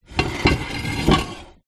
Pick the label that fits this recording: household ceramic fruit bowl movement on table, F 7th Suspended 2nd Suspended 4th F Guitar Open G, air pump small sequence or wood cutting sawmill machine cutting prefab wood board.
household ceramic fruit bowl movement on table